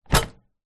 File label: household bread maker lid open; panasonic sd 2501